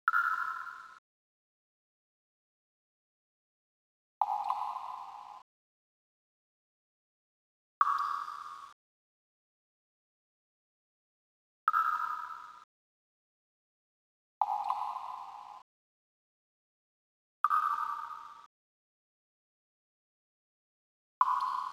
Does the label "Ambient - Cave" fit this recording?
Yes